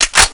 gun switch from; svencoop; hl2; alyxgun

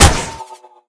pulse cannon shoot; svencoop; hl2

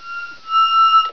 mic; svencoop; echoes; agamemnon

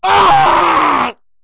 death1; svencoop; st allies; crimson commando

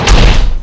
door stop; svencoop; sence; mistake coop

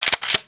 shotgun pump; weapons; svencoop; aomdc

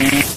hopper jump; svencoop; hl2

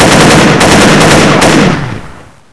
buzzer; svencoop; sence; mistake coop

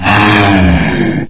ghost die; svencoop; custom monsters

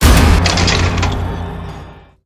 armor pickup; svencoop; morass beta

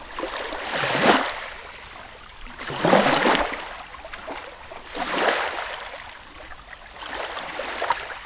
tide close; ambience; svencoop

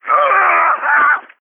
armorman die; npc; svencoop; morass beta

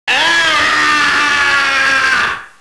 buzzer scream; svencoop; sence; mistake coop

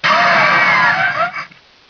zombie pain; svencoop

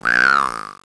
injur, pupae; svencoop

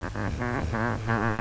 zombie idle; svencoop; biglolly coop

